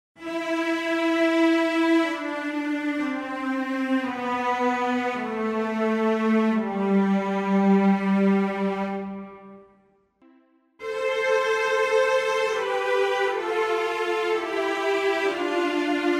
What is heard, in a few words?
String Ensemble Pedal Legato